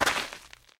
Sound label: gravel land; Golden Crayon Sounds